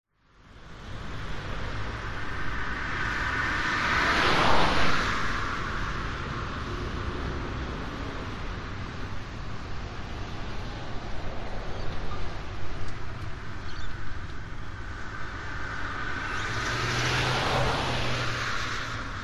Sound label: vehicles traffic main road town pass by bursts